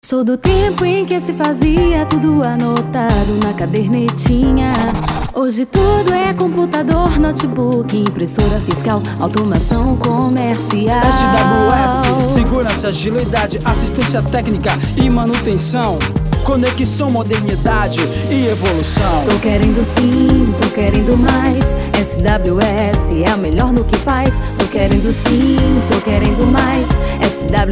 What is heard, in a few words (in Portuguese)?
Jingle Automação Comercial; SWS